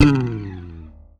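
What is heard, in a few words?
slap, bass; Xsample VOL-1; SLAP+THUMB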